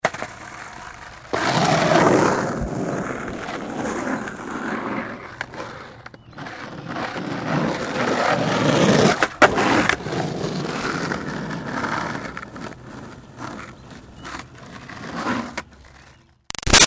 Skateboard Olly Rolling Moves; Pass Bys; Sports; Skateboarding; Big Room Sound

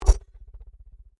Convolution Surge; Sound elements to create more complex sound effects; Swell Sound Effects; Swells (Sound Effects:Sound Design)

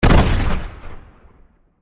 Laser Cannon, Blast, Explosion, Double; Battle Sound Effects; BlastWave

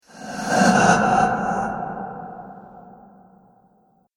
Possessed Ghost Spirit

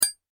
impact metal small; Materials; McKinney Sound

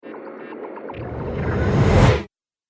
Trailer Hit Sf Data Stream; Sonic Logo Sound Effects

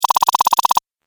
8 Bit Style Tapping; Video Game Sound Effects

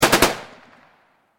warfare gunshots machine gun burst; McKinney Sound

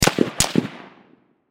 Gun Handgun, .45 Springfield Double Shot Distant; Pistol; Warfare; Gun Shots; Blastwave

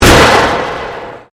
Convolution Pulse

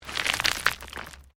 STONES MOVE

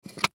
Key Pick Up; Foley; Pick Ups; Objects; Blastwave

